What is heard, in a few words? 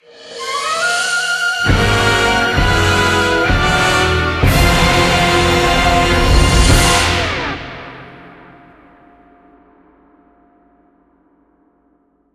Victory Jingle 76 4-4; GreyWraithPhase2